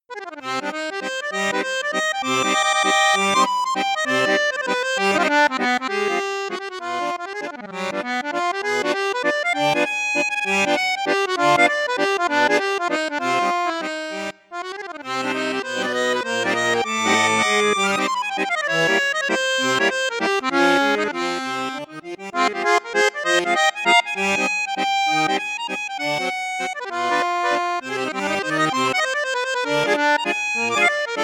registre flute juste, accordeon; piermaria 315

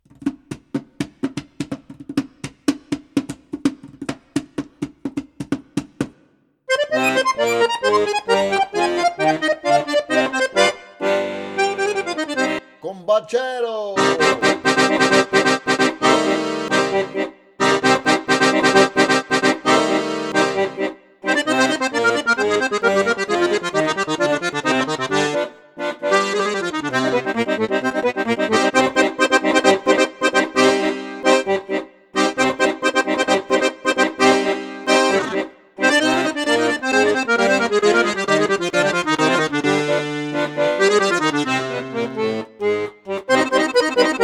registre basson flute juste flute haute, accordeon; piermaria prodige plus

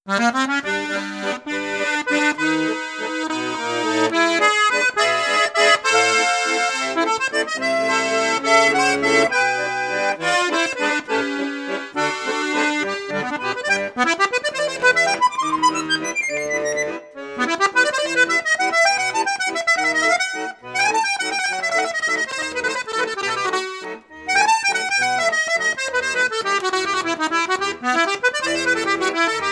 registre flute juste flute haute, accordeon; crucianelli king

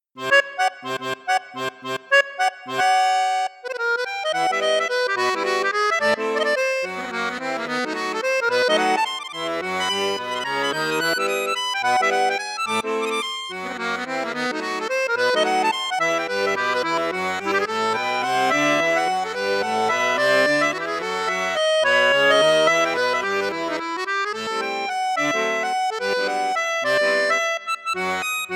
registre flute juste, accordéon; crucianelli 25

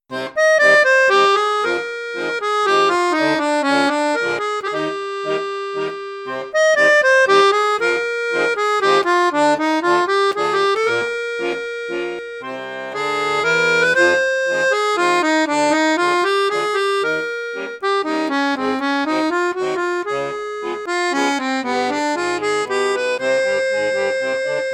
registre basson; cavagnolo virtuose 4

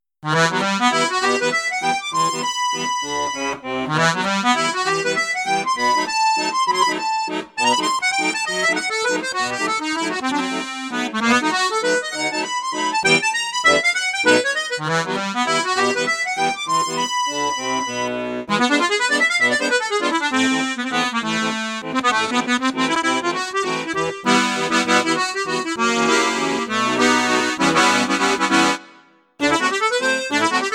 registre flute juste flute haute, accordeon; cavagnolo vedette 10

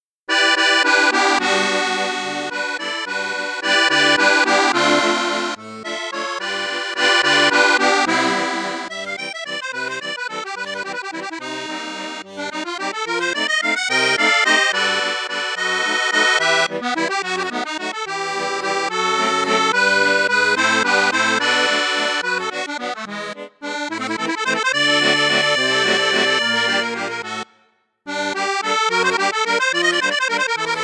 registre flute juste flute haute, accordeon; mengascini musette

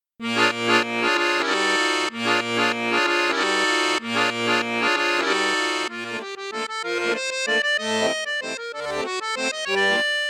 registre flute juste, accordeon; cavagnolo vedette 5

